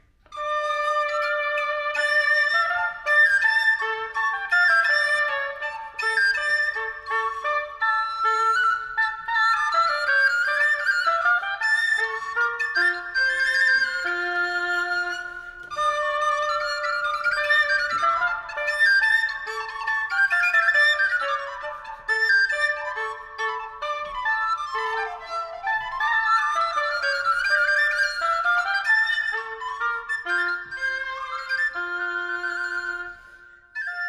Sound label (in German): floete oboe impro live